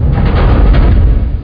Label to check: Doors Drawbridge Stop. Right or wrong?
Right